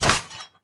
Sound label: armor